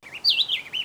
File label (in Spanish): canto bienteveo, Bien Te Veo de Puerto Rico